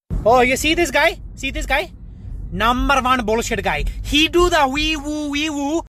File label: wee woo